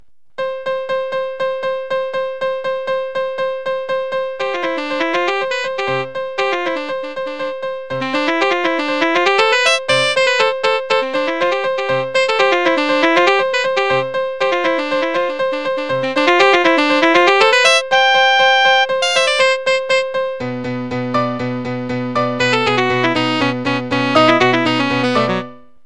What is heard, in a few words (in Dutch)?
dialoog voor piano en saxofoon